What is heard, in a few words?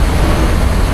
cap spin loop; video games; doomsday; source engine; tf